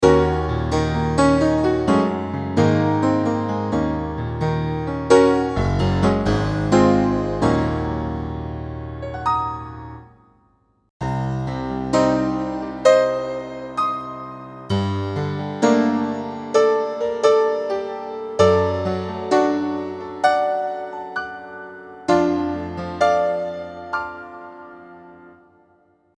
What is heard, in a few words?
Piano; live instruments; LIVE Piano CF3